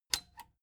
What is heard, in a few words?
plastic button latch squeak click enable slow